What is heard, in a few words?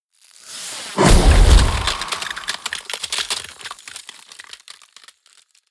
ice ball hit crackle; whooshes rises